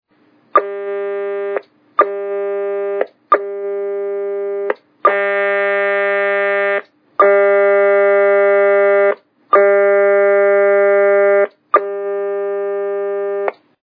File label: AstroTAC P25 Receive